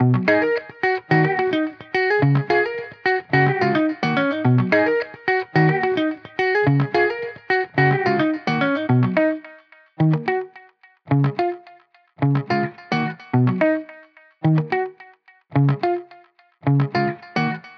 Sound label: 108 BPM C# Min, Guitar; California; Cymatics; Miami Guitar Pack - Pt 1